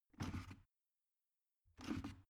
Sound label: plastic water boiler lift up